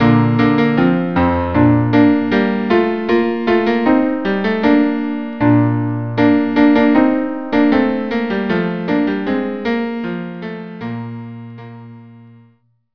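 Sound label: cello cello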